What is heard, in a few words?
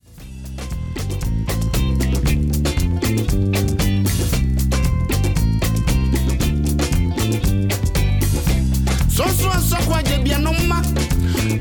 High Life, african tam tam